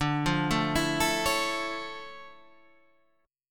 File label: D Minor Major 9th, D, down, Guitar Standard; DmM9 arpeggiated